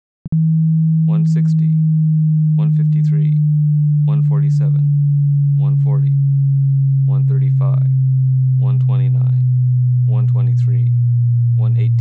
Low-frequency sweep with voiceover; Subwoofer Evaluation and Setup; Surround Spectacular

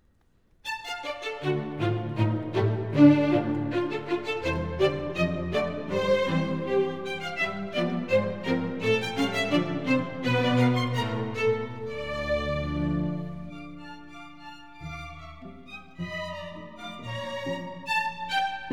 Preludes Allegretto

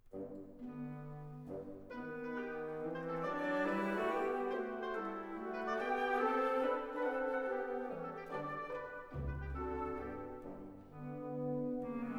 Enigma Variations, for orchestra, Allegretto; Elgar

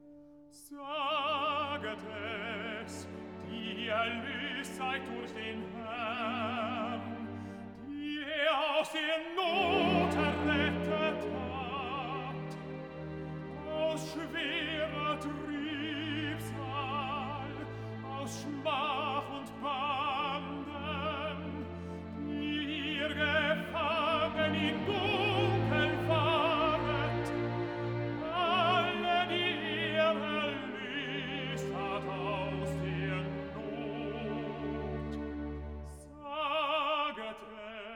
Symphony in B flat major

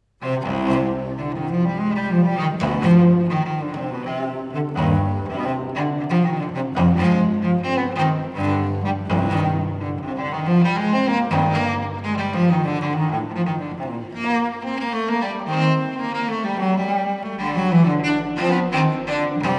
solo cello; C minor; Courante; BWV 1011; J.S. Bach; Cello Suites, BWV 1007-1012

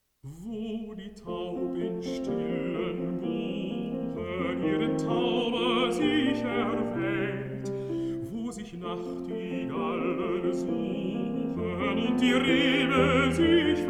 song for voice & piano

